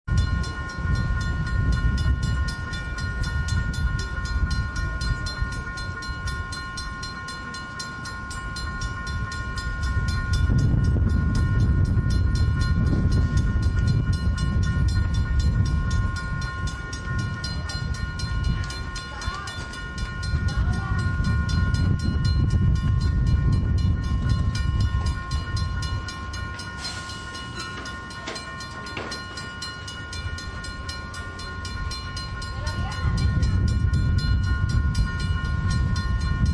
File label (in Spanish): paso a nivel y trafico; villa crespo estacion chacarita y avenida corrientes